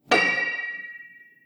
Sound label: piano